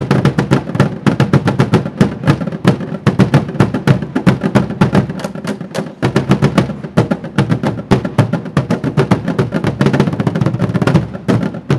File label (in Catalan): fragment bombo